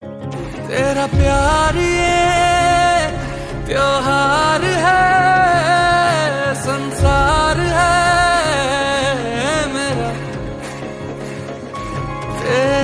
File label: hindi ringtone